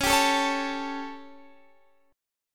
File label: G Suspended 2nd Flat 5th C sharp, chord